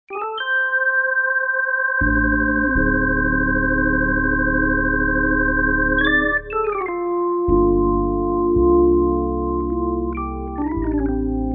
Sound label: Organ; wersi